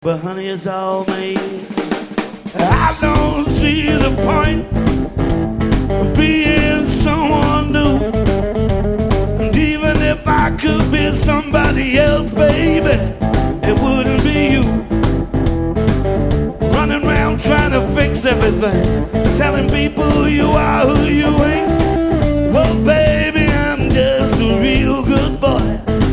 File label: Buzz